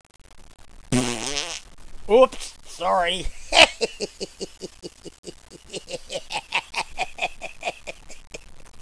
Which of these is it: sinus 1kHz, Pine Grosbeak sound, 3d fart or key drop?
3d fart